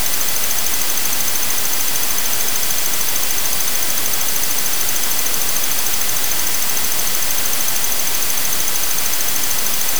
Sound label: door bell receiver